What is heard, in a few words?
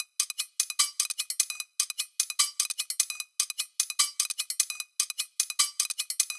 Kitchen Foley 150BPM, drums; Antidote Advent; Kitchen Foley Sounds & Loops